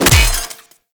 Melee Hammer Impact Robotic Flesh; Hammers

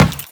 Run Metal; Footsteps; Warframe; Teshin

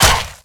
Step Default, Infested; Zealoid Prelate

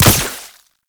Wall Climb Dodge End Point; Warframe; Oraxia

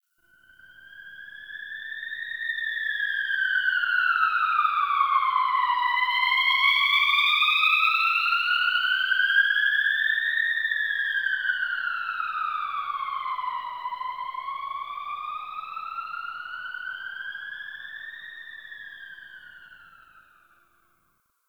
Tunnel Distant Siren; Warframe; Ambience; Nineteen Ninety Nine

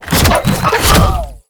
Launch Shroom Cast; Warframe; Nokko; Launch Mushroom

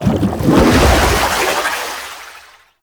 Aqua Board Move Start Boost; Warframe; Yareli

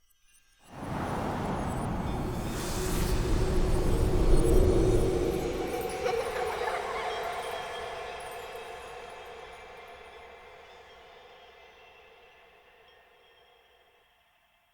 Wake Up Enter Hut

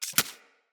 Arachnoid Micro Step Snow; Corpus

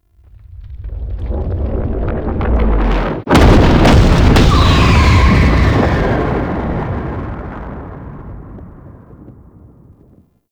Heal Cannon; Warframe; Tau; Cinematics; AdisHeal

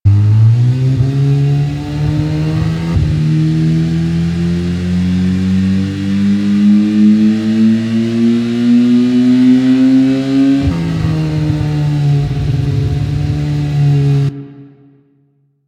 Motorcycle Engine Rev Up; Vehicles; Warframe; Motorcycle Ninety Nine

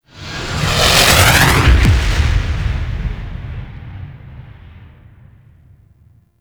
Ship Fly By Slow; Vehicles; Warframe; Liset; Liset Flight; Grineer Ship Liset

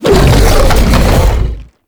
Biped Windup; Warframe; ManInTheWall

